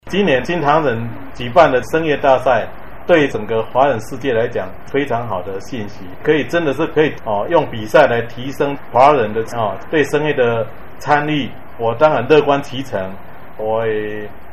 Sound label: Vocal Music